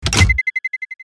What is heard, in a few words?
blast pickup